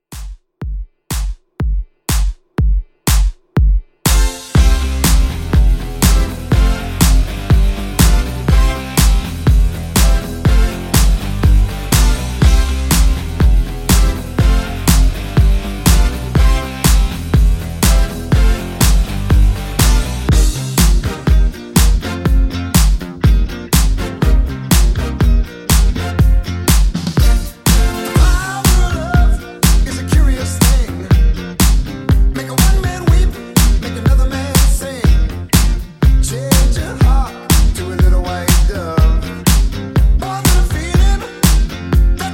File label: 80s Rock Mixshow Mix 122